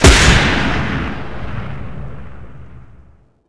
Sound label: turret cannon 1shot stereo